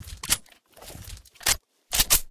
mp reload; STALZONE

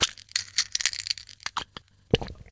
pills; STALZONE

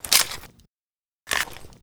travmat reload; STALZONE

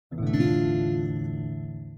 Dm accord, guitar; STALZONE; customnpcs; stalker

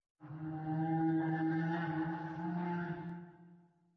moan; STALZONE; minecraft

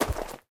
gravel, step; STALZONE; minecraft

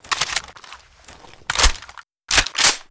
vsk reload; STALZONE

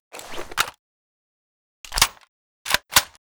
sok94 reload; STALZONE

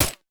m1911 silencer; STALZONE

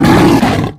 giant attack; STALZONE; customnpcs; stalker

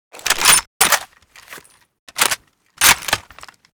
m24 reload; STALZONE

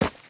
sand, step; STALZONE; minecraft

